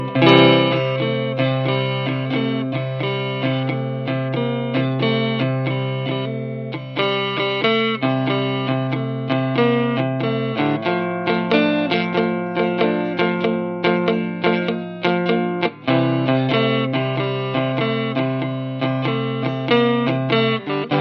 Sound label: Strato Sample